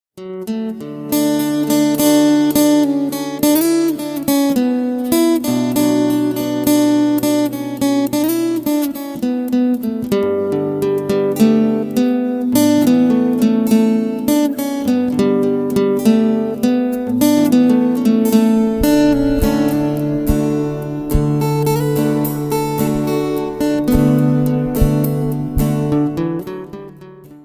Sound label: Guitar Slow